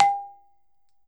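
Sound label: BALAFON, Pitched Percussion; E-MU Producer Series Vol. 7 – Old World Instruments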